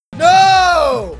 rage; w00tguy; arcade

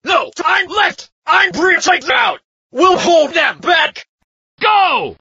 grunt; sven; dialogs; e1m1

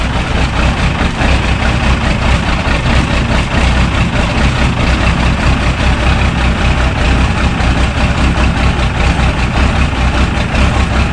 cummins idle

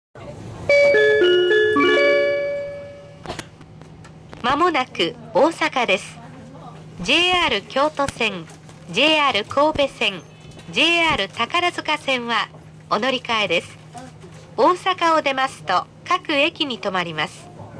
japan train

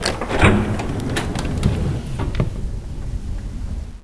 door move

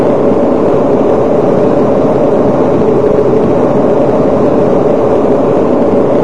machine, ambience

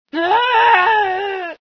scream, female sci

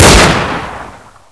g3 fire; guns

